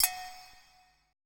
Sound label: E HITUBE, ETH PERC